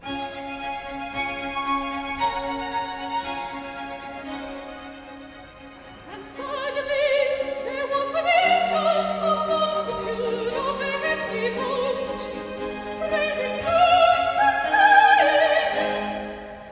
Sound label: Recitative-soprano; And suddenly there was with the angel; Handel Masterworks; Klassika